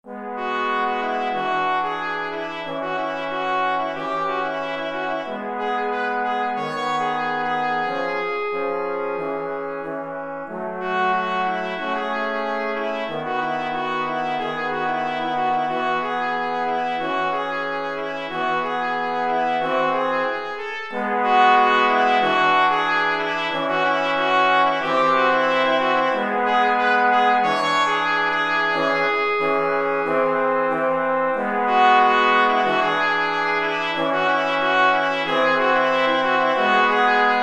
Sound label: brass